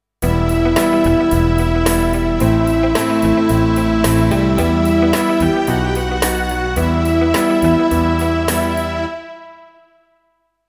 Em Riff pop ballad engl. horn, fag. + bass clarinette 110